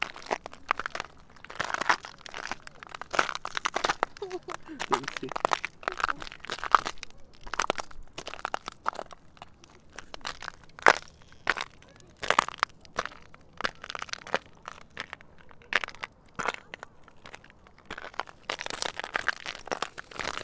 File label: Stomping On Ice